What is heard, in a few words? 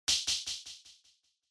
Hand Synth Clap Delay